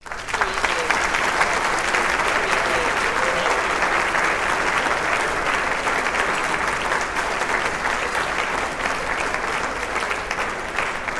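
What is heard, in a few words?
Large Crowd Applause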